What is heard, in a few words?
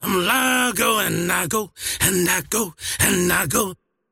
singing; dota; largo